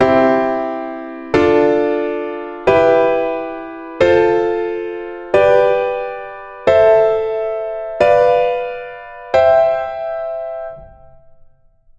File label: C Major All Chords